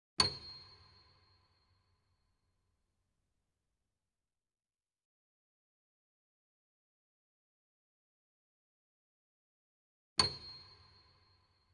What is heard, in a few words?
C8 Grand Piano & Effects; Sound Sampling Collection VOL-3; Grand Piano 88